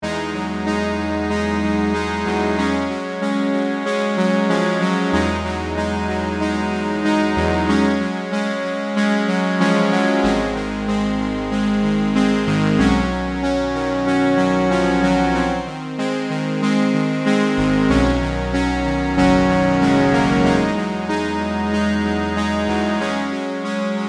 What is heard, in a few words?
staccato strings; bluewave